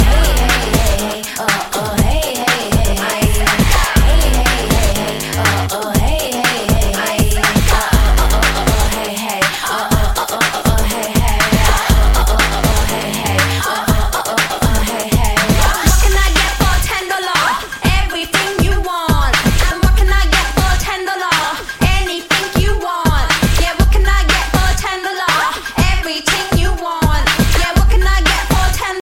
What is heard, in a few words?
ringtone